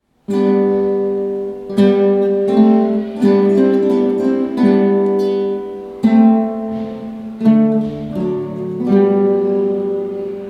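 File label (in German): Kling Glöckchen, Gitarre, Hall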